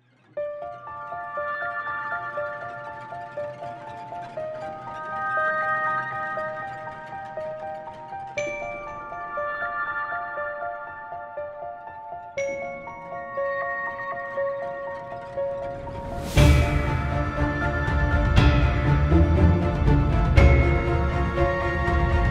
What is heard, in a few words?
Background Music; Greater Los Angeles Area Council, BSA; Virtual boo Fest; Virtual Haunted Scout Mansion; Scary Background Music